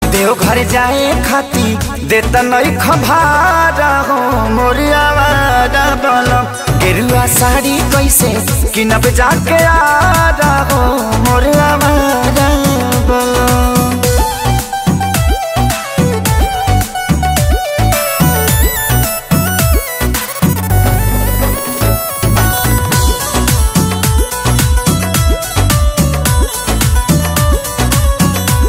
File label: Ringtone